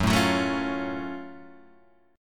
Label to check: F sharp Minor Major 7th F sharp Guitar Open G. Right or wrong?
Right